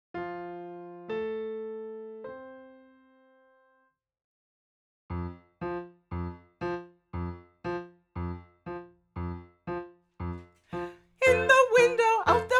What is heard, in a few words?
Old Woman Sop